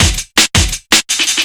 165, Drum Loops, Drum & Bass

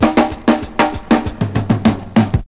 Fills, Roland; Sound & Vision - Gigapack I; Perc

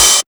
OHH; X-Static Goldmine 1

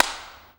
CLAPS, SINGLE CLAPS; Best Service; World Colours

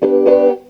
CLAV GEL; USB Soundscan vol.32; Roots Disco; AKAI

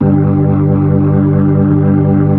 B-3

